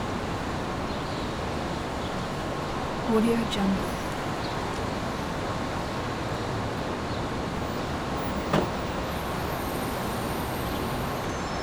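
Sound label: ambience city street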